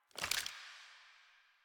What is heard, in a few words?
Pistol Reload Idle; Weapons; DE; Warframe; Tenno; Lasrian